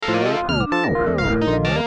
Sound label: goofy ah ring tone